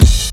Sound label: KICK; drums